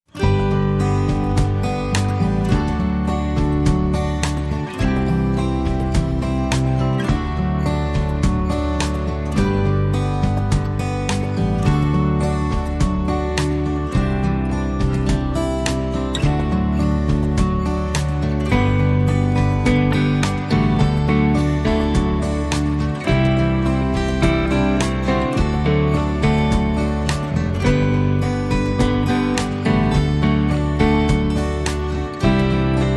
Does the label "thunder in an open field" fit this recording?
No